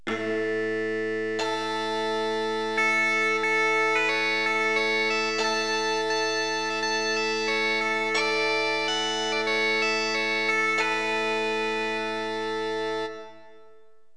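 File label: bagpipe; gm-sound; Roland; An Invitation To The Roland World Of Music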